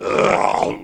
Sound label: zombie hit; wesnoth